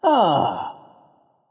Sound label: ah understand; smite; voicepack; hou yi